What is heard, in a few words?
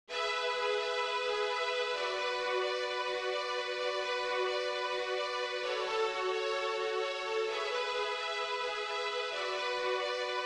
Quartet